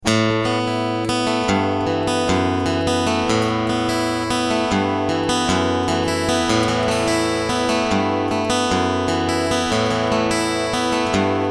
Single Picking

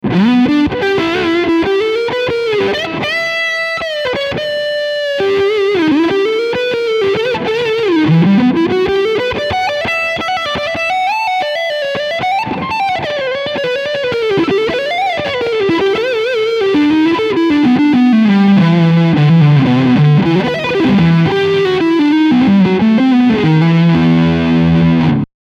Leadsound Front PU

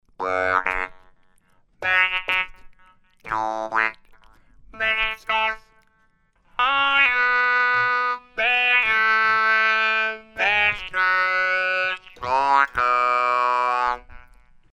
Dunlop MXR M222 Talkbox

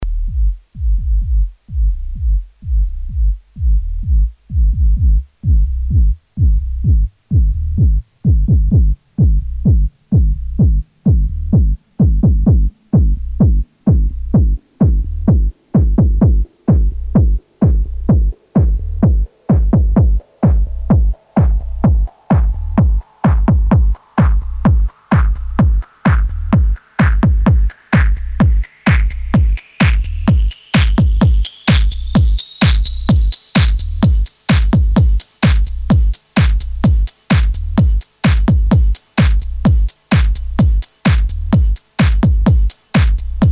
Drumloop

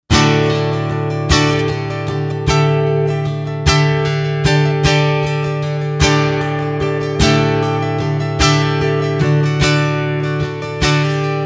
Jumbo

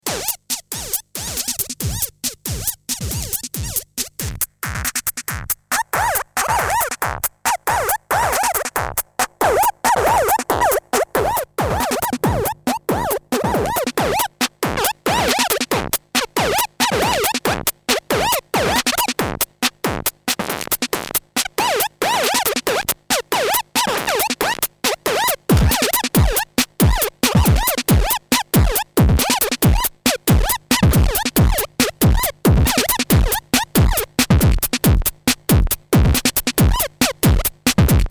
Synchanger 4000 Squeaky Drums; Stramp

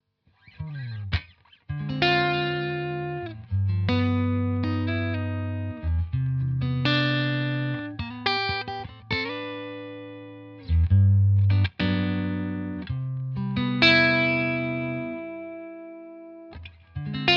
Clean Class A Strat Reverb

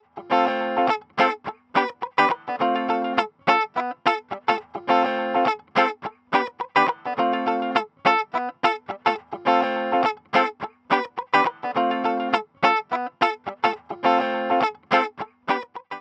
Pedal Clean; Ableton

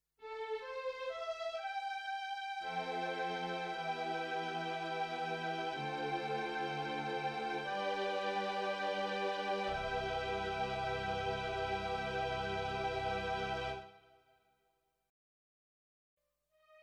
CP4 Stage Strings

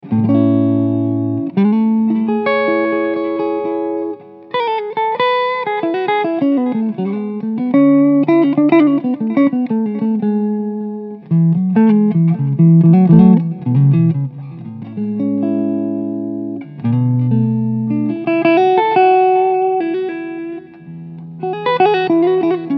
düsenberg Hals P90 clean

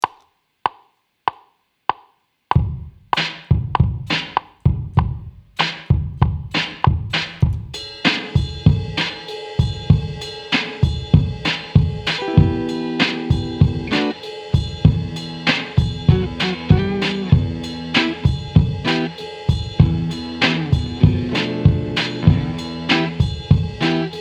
AMP Session

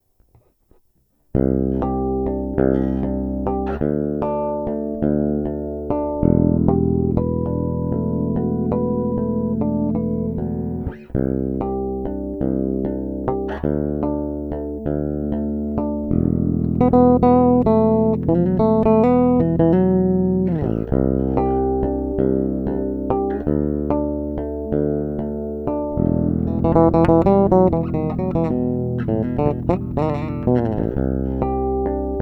Cort B4 plus AS Bridge PU

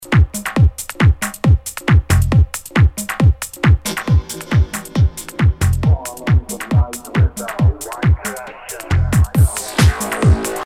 Pan

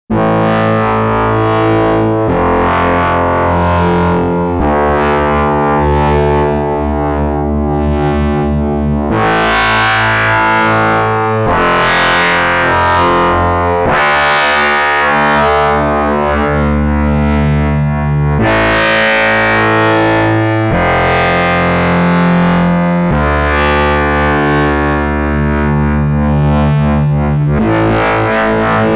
Sequential Pro 2 FM Wobble

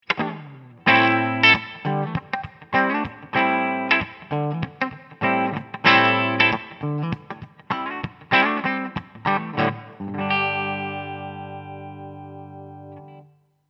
Clean

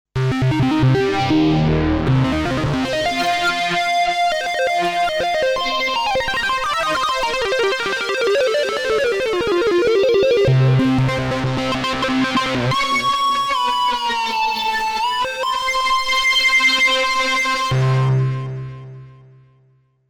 Reason 10 Europa; Psybeam

